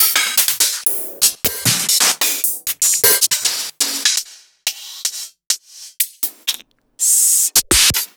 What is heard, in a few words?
Open Hihats